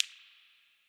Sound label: Guitar Spring, impulse responses, spring reverbs; Medium Spaces; Apple